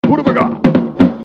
notification

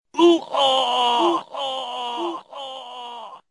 lose

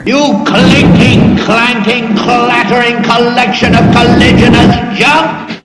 clinking clanking

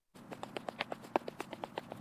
footsteps sound effect

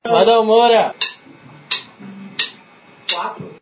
hehehhe

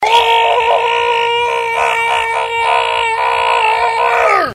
roar

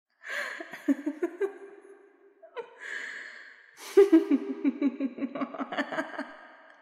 evil laughing woman, woman laugh

